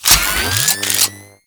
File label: Air Pistol Reload; Warframe; Corpus